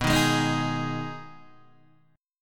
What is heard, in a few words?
B 6th B down Guitar Open D